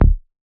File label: Raw Bass D#